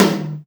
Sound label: Toms